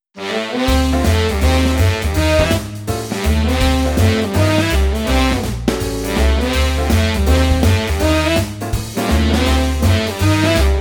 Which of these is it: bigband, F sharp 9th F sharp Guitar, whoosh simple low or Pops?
bigband